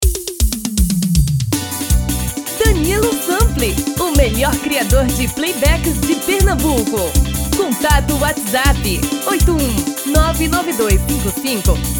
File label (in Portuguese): FREVO Tom masculino